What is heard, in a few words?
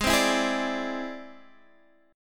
E Augmented 7th Ab